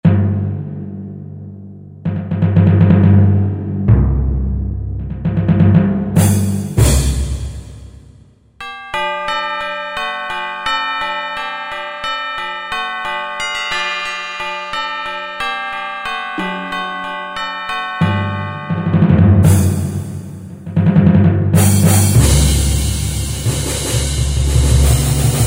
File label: Orch Perc, Korg 05RW